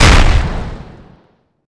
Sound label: turret fire; zmall